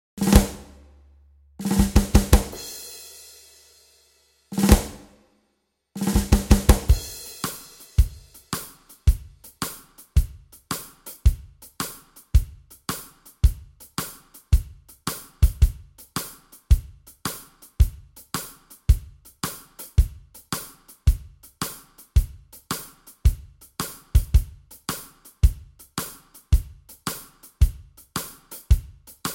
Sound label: beat